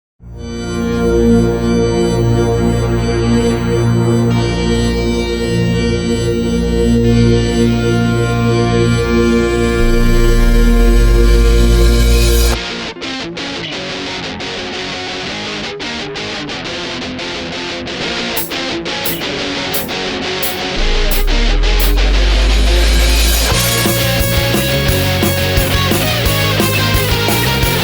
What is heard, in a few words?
Luna (Alt Mode) Instrumental, Pop-Punk